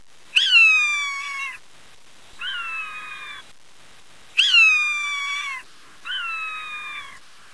swainsons hawk

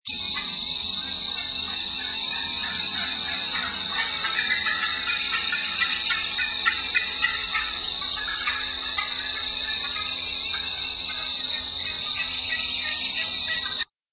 Turkey Fly Down Cackle

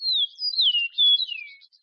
Meadowlark

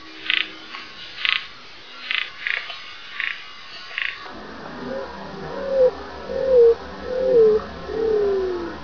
greater roadrunner

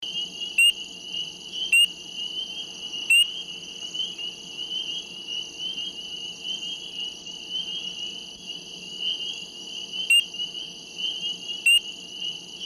Nighttime Peepers

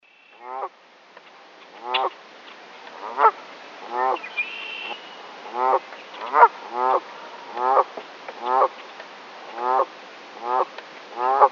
Canada Goose

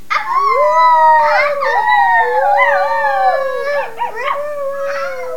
wolf pup howl

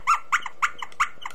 squeaky rat

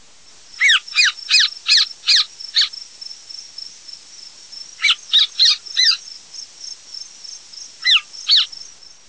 elf owl

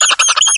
rat hit

